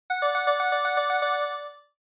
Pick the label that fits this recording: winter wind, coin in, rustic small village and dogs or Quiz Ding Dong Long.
Quiz Ding Dong Long